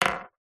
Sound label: jewelry ring heavy set down wood surface; household